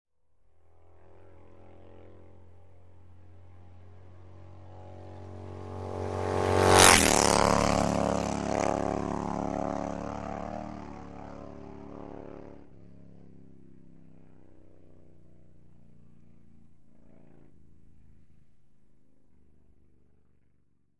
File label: Harley Motorcycle By, harley-davidson ext bys